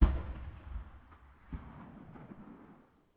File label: ambient shot stereo